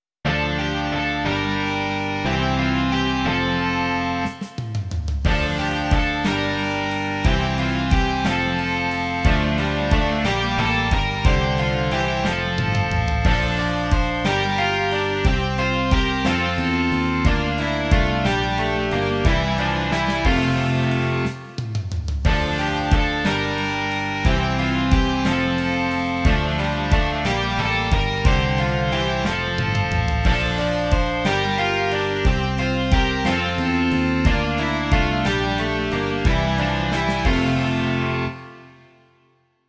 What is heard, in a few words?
rock